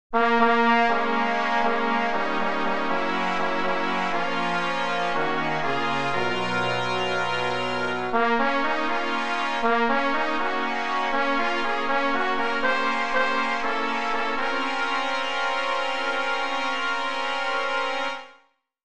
Tenor, barbershop

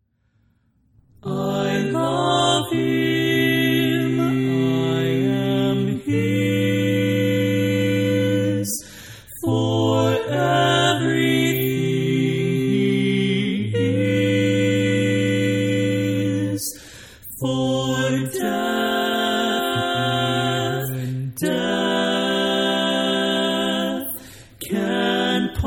Tenor, barbershop tags

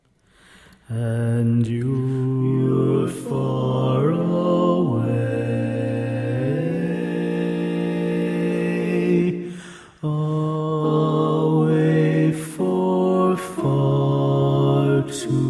Bass, barbershop tags

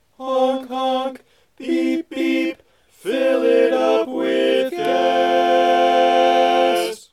Honk Honk Lead, barbershop tag

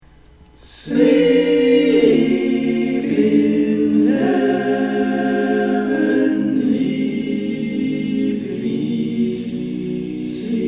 barbershop tags, all parts

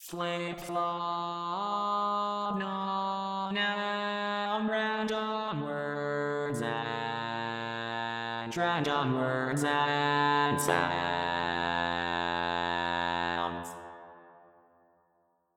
Random Words and Sounds, Bass, Barbershop

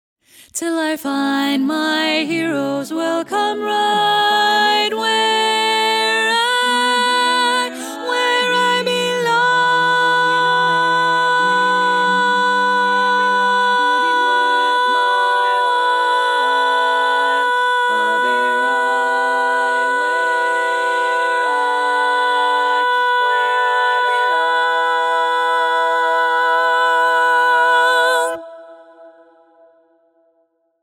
Lead, Barbershop Tags

